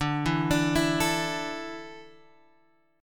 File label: D Suspended 2nd D down Guitar Open G